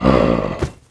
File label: death, zombie